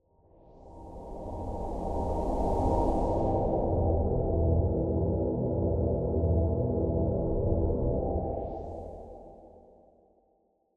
Textures Spirits Sprites Dense Underworldy Resonant Emin7; The Chris Alan; ASTRAL; Otherworldly Spirts, Abstract Atmospheres & Textural Soundscapes; Game Audio Bundle